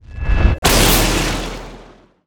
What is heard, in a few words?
shock; thor